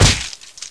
headshot, player; cstrike

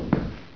jump, player; cstrike

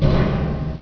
grate, player; cstrike

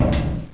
metal, player; cstrike

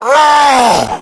pain, tank; cstrike; umbrella swarm

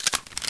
g3sg1 slide, weapons; cstrike

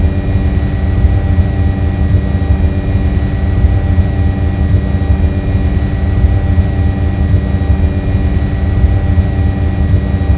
plane drone; cstrike

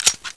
fiveseven clip out, weapons; cstrike

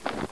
snow, player; cstrike

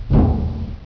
duct, player; cstrike

